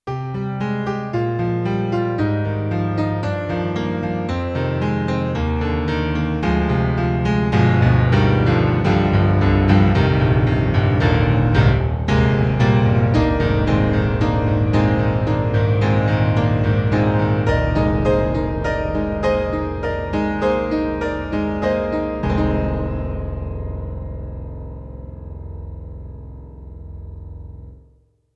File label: Alesis NanoPiano, Acoustic Piano; TrueStr8vb